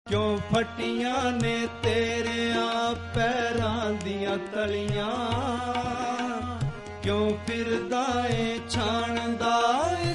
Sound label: gurubani punjabi